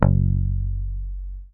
MUTE F#1, Bass; Sampleheads; Will Lee Bass Library

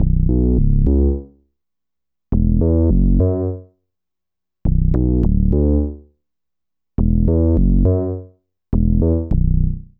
BASS LP; East West - Phat & Phunky CD2; 90's Sample CDs

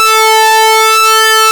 SYNC, ARP Synths; Analog Odyssey; E-MU Formula 4000 Series Vol. 3 – Analog Odyssey

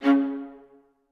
C2, Orchestra; AKAI Advance Orchestra Upgrade 97 Vol.1

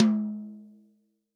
TOM, Real Drum Kit, POP KIT; REAL DRUMS; Best Service ProSamples vol.43

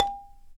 BALA, Balaphone; Roland LCDP11 Africa VOL-1; Bala & Xylo

